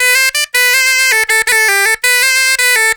BOMBAR, Celtic Flavours; USB Soundscan vol.42; AKAI

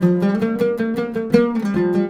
LUTH J, Arabian Traditions, LUTH RIFF; USB Soundscan vol.43 - ArabianTraditions; AKAI

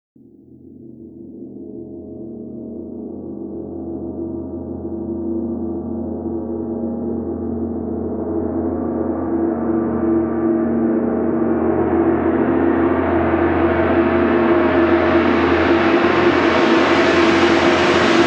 Reverse Cymbal; Sonic Foundry (Sony Creative Software); Cinematic Funk Urban Tone Poems; Session 05 - The Triad

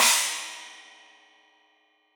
China, Crash, China Cymbal

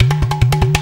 WATERCAN; Best Service; World Colours

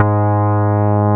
BAS Mini, BAS1SYN; Best Service Gigapack 2

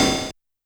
Metal Noize, YAMAHA RM1X

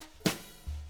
BRUSH LOOPS, Living Drums

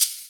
Shaker; Equipped Music; Loungin House

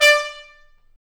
BRS TP STA; Stabs-Swells; Roland L-CD702

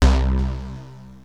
Access Virus B; Synthworx

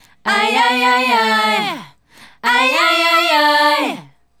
Female Group, Vocal

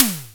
SNARE, Simmons SDS5

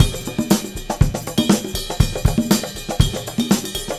Combo, R&B Drums

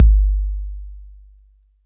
Roland 808 BD

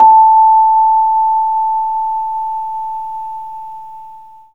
Rhodes A; 3D Sound; 3D Keys VOL-1

